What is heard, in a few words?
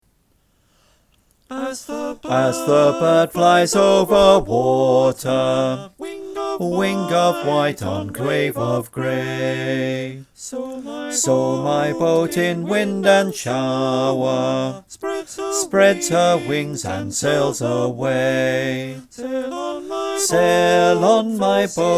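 Bass, choir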